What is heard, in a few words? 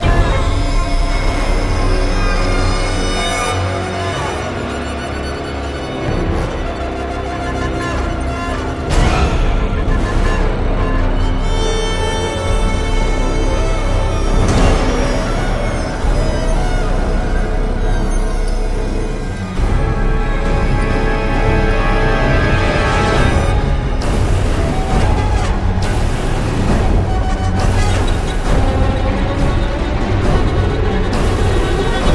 Combat Insectoid Alien Horror